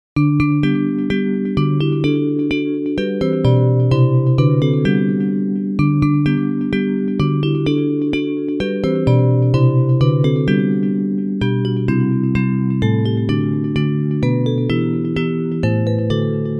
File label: Bells; Hymns